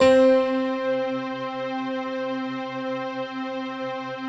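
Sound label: bright electric piano strings, Slow Octave Piano Strings; Optical Media International - Sonic Images Library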